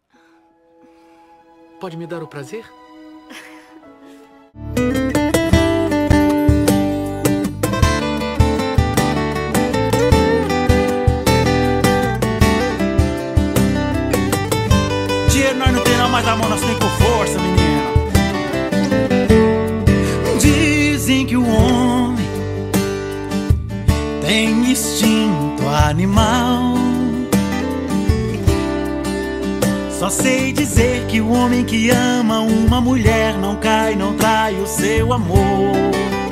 acustico

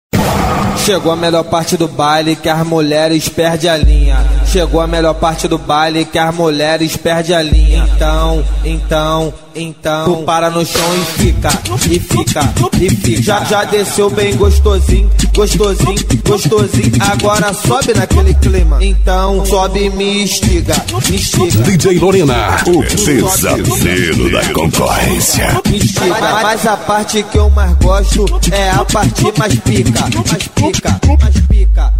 funk

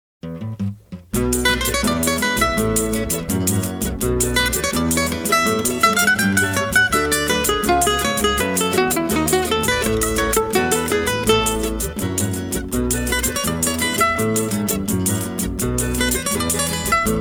cavaquiando instrumental

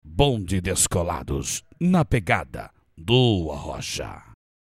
vinheta

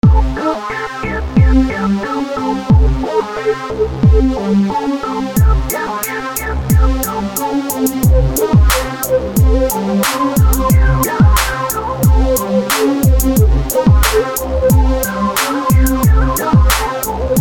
beat de rap trap; Beat de rap - foda

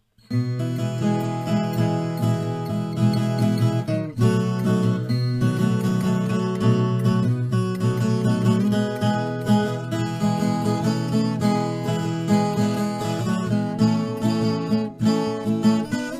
trilha instrumental de viola caipira

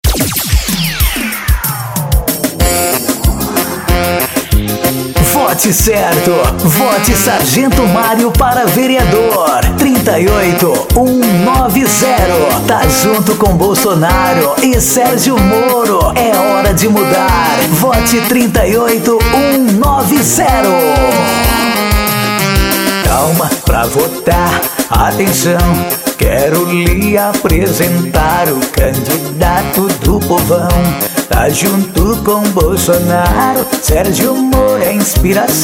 jingles politicos pisadinha; pisadinha da vitoria

